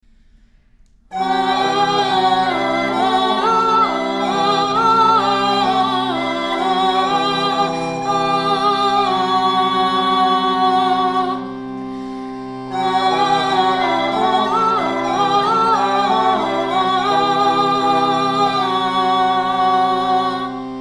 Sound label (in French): polyphonie à deux voix orgue positif flûte lirone en bourdon